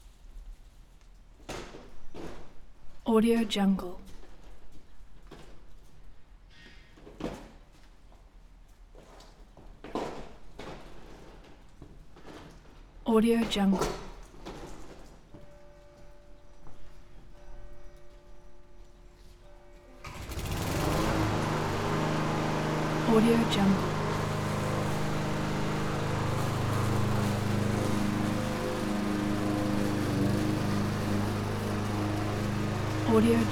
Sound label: ambience garden inner yard lawnmower